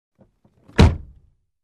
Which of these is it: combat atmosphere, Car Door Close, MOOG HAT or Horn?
Car Door Close